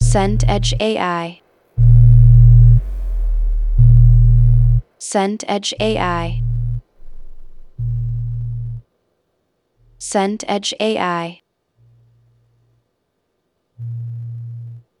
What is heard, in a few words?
room tone warehouse